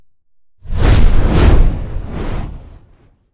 Flam Eater Flame; Legend of Keepers (gamerip) (2019)

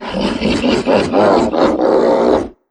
Troll Monster Laugh

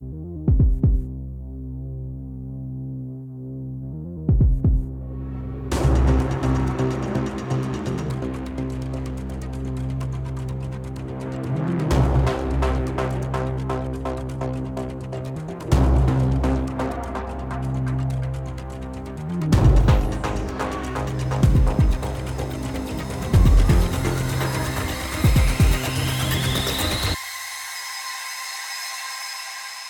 Toxic Fight Build 14 Bar 126 Bpm

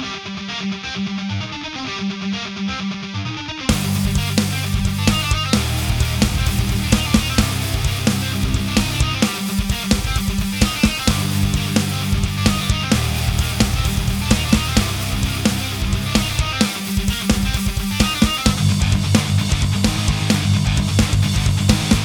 Powerful & Brutal Rock Theme (looped)

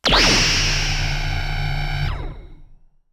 Large Sustained Beam Evil

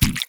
Paint Blob Impact; Paint Blob Impact 05

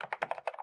Friend-Typing

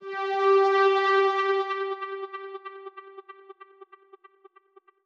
Special-Photo Impossible-Triangle Synth

